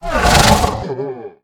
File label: UV Pain; The Inn; Zara